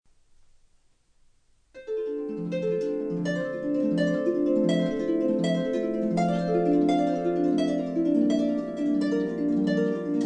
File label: The Little Fountain, harp